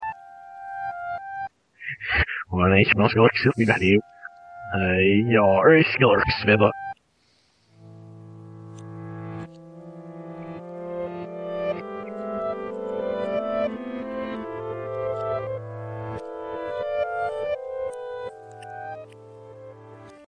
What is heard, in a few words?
piano, reverse